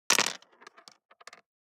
DICE on Plastic, Throw and Roll, Standard, 4 Four Dice; Samples; ArticulatedSounds; DICE Sfx Party (Extended)